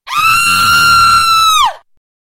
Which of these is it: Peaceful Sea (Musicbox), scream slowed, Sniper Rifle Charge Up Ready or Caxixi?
scream slowed